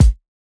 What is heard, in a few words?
Lofi